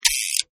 Revolver Chamber Spin, Revolver Bullet Chamber Spins; Gun Handling (Sound Effects:Guns)